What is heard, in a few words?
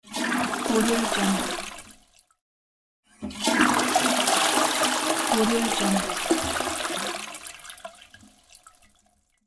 toilet flush